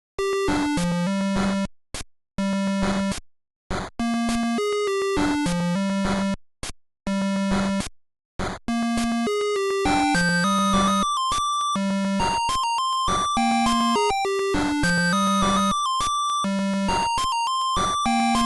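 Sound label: music menu, Game Boy Color; duality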